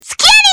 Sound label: grenade; csgo